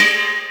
Alert Crash

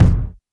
kick

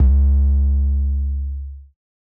808 MID

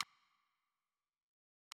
rimshot Snare

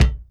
KIK TIN, Kicks

